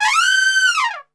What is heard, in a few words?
G 4 TRP, Brass; Best Service; Brass Super Section